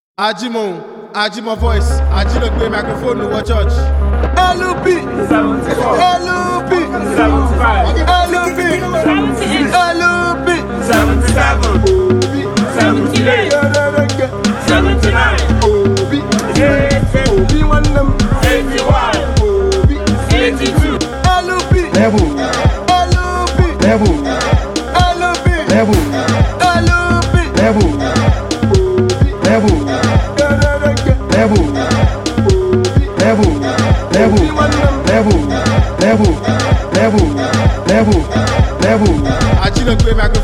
Dance Beat, instrumental; Peter Obi

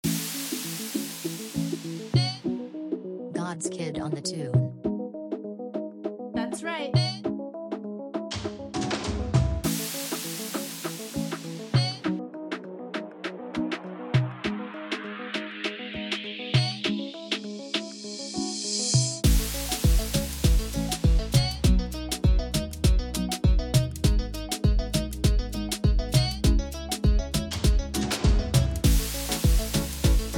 Type Beat

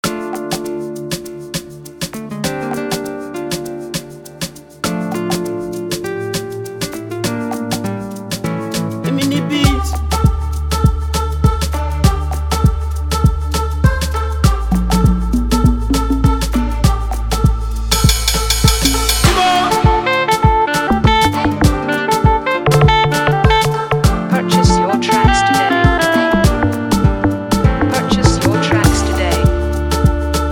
Amapiano

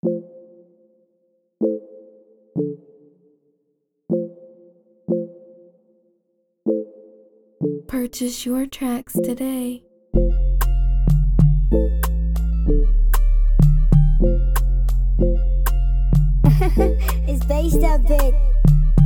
Type Beat, afrobeat instrumental

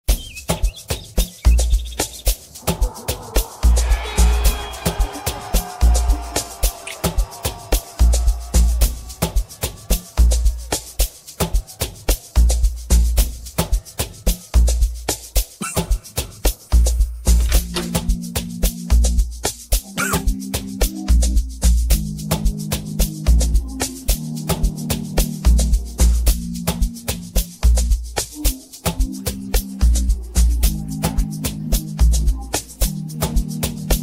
instrumental

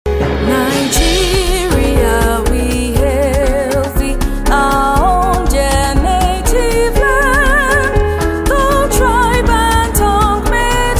New National Anthem - Nigeria, We Hail Thee!

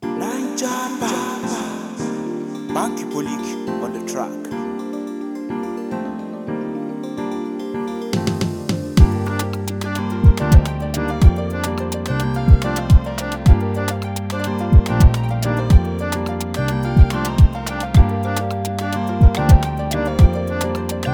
afro progression type beat; afrobeat instrumental

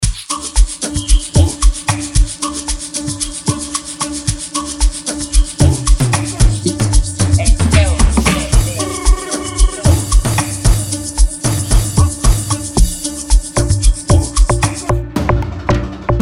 Amapiano Type Beat, Afrobeat instrumental

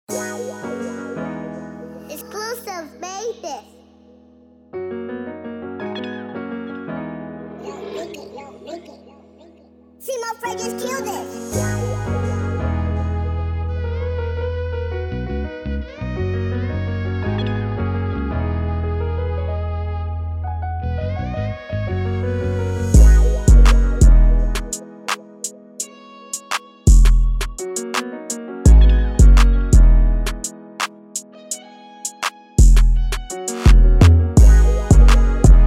Instrumental; hip hop type beat

